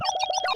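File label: Beep Yellow Reverse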